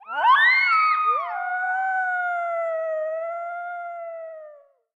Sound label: howl; the wolfs night